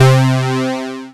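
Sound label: synth analog; Best Service Gigapack 1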